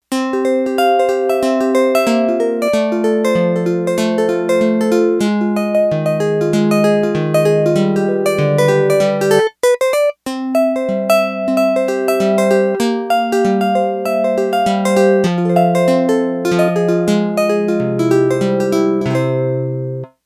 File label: Pick Guitar, Yamaha TX802; Factory Patches; Voices